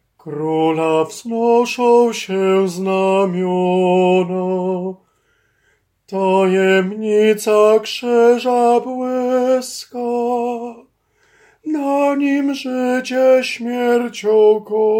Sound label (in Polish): SOPRAN; Krola wznosza sie znamiona